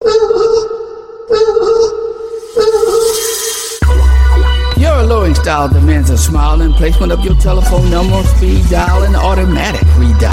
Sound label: ringtone